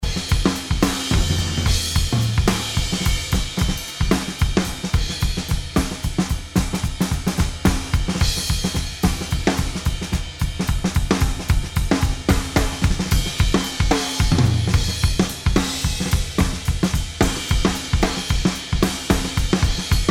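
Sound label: Drums Bus Room Reverb On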